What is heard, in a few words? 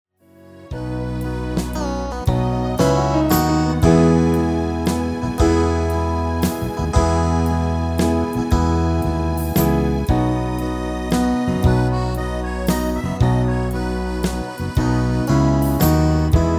MIDI